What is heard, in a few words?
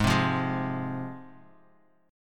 Ab 6th down Guitar; Ab6 strummed; 4E,4A,5D,5G,5C,6F